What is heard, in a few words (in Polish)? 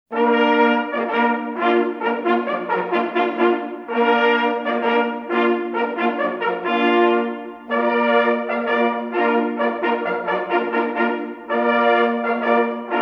dzik na rozkladzie, sygnaly